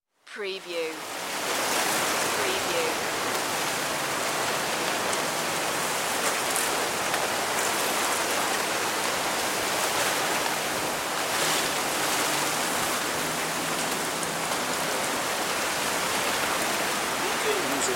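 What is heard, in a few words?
Wind Palm Trees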